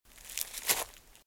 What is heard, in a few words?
Footsteps Leaves Shuffle; RadwinIsland